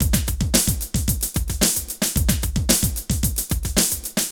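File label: drum loops, left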